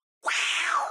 meowcles sound

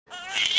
ringtone

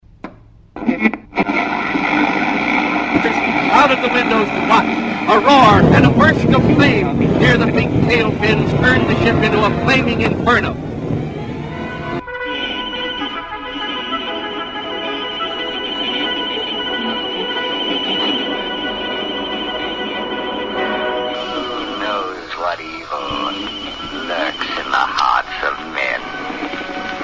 Radio Tuning

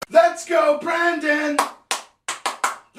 lets go brandon chant

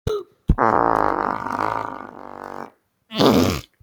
explosive diarrhea

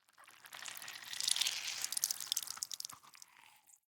alert

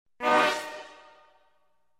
space horn honks

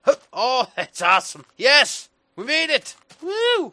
jungle bams

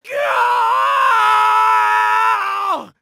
scream; tekeda mkx

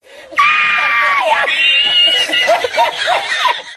spanish guy laugh

